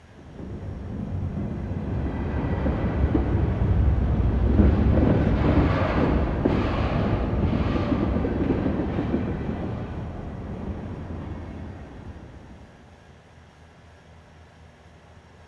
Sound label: train distant pass